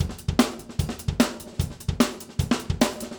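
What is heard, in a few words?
150 bpm, beat loops; Zero-G; Monster Beats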